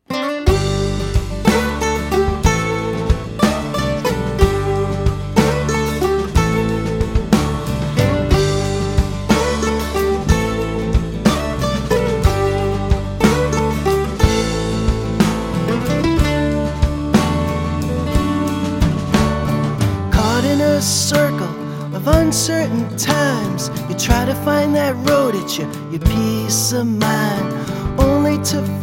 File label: folk rock